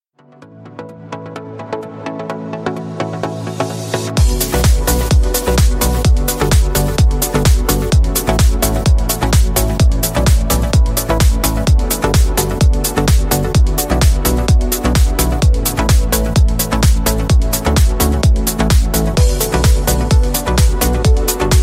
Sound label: trance, ringtone